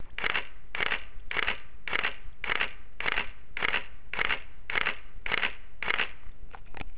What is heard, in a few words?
Nikon D80; JPEG